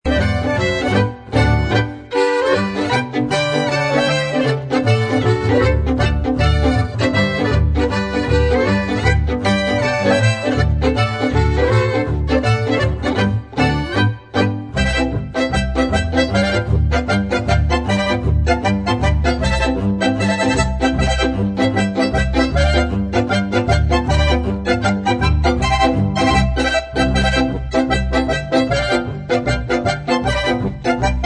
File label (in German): Polka; Kupferschmied